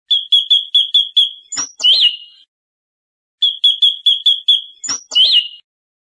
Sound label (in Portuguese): canto bicudo